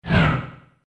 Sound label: fly guy fireball; Звуки из игры Марио